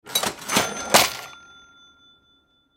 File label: Cash Register, Cash Register Drawer Open; Office; Cash Registers; Blastwave